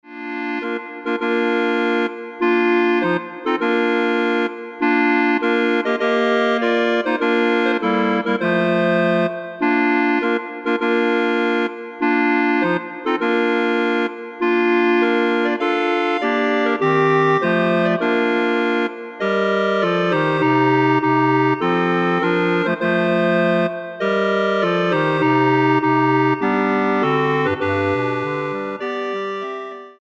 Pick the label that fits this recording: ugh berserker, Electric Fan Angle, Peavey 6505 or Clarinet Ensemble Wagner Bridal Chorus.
Clarinet Ensemble Wagner Bridal Chorus